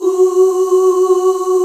MIDDLE OOHS

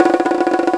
Bongo Low Roll; Digital Sound Factory Virtuoso

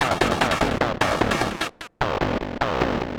Atonaloop, Industrial Analog; Analoops; Sonic Foundry (Sony Creative Software)

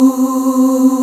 VOX C3; Vox Percplex'd

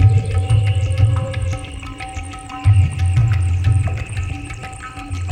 Ambi Groove

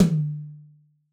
Yamaha DJX 2B, Room Tom

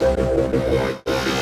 Seq Frogs, Drum & Bass; Sonic Foundry (Sony Creative Software); Knutrix Drum & Bass

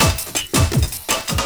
LOOP, 162 BPM, Drum & Bass; Ueberschall; Drum & Bass Resonance

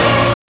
SYN Techno Voi; Best Service Gigapack 1

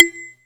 MINI RIMBA; Rubber Chicken Software Sample Bank

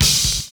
DOUBLE BD; Univers Sons

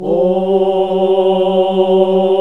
OOH G, NUNS AAH OOH; Sound & Vision - Gigapack II

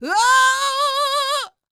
D BIT, Vocal R&B Dance

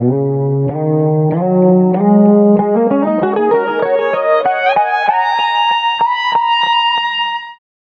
durga, stringed instruments; Sonic Foundry (Sony Creative Software); World Pop

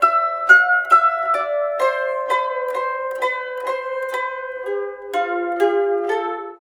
SITUR, SITUR PH; Spectrasonics; Heart of Asia

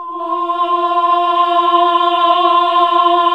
HAL AAH, Nuns Aahhh

